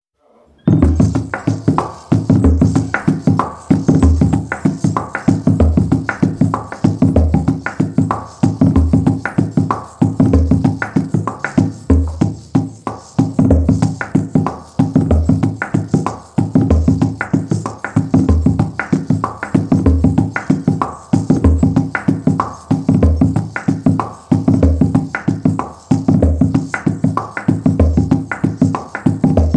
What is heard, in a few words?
percussion solo